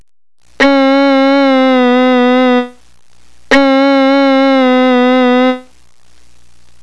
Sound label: portamentos III and IV